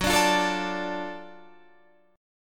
F sharp Minor Major 7th Sharp 5th, Guitar, Standard; strummed; 14,17,15,14,15,17